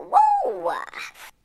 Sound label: "woo" cartoon; ooh ahh; ho hoo woo